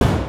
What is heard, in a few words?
explode; sky diving3